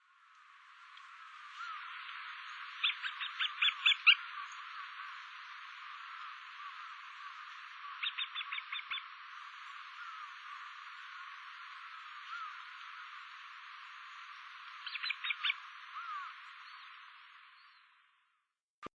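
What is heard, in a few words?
Eastern Marsh Harrier; chuhi; watarase; koe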